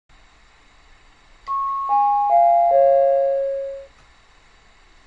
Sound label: down